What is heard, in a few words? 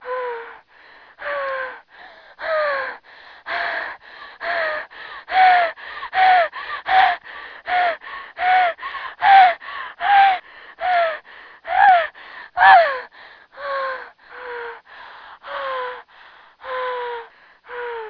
female pleasure; Female orgasm